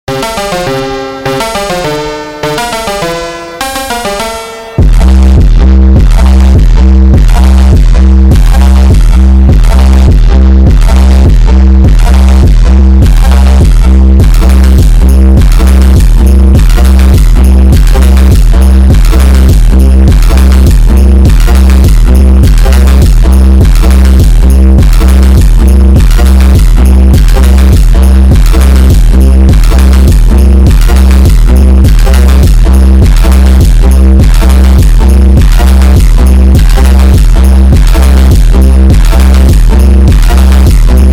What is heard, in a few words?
Christmas Phonk bass Boosted Car Remix Drift Phonk